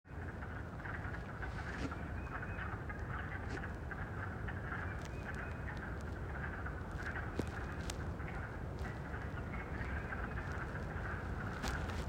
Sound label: wood frogs